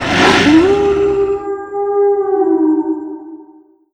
howl; weapons; dota; lycan

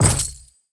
chest drop; ui; inventory

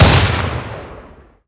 attack, sniper; weapons

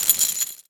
chain movement; pudge

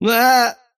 wheel laugh; dota; kez

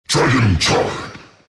dragon attack; dota

